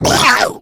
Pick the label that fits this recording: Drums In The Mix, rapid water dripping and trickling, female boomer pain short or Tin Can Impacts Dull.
female boomer pain short